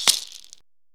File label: maracas; DRUMS & PERC